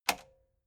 metal button spring click fast

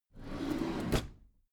packed drawer smooth open regular

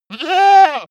goat baa

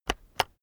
keyboard external enter press slow heavy

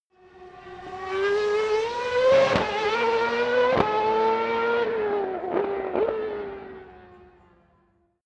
racing car f1 drive by shift up down

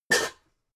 pots hit clank

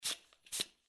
airpump

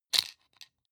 plastic frame small hit rattle

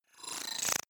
data transfer splutter

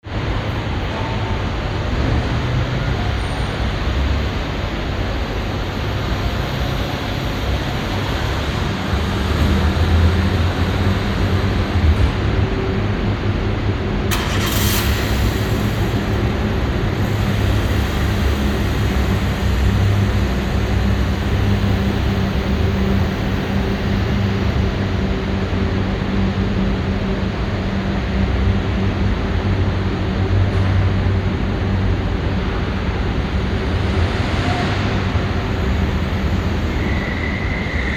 basement garage

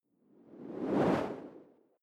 whoosh simple flutter

